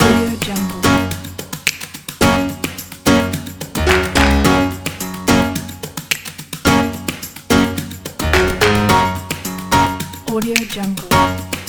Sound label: funny positive happy uplifting pop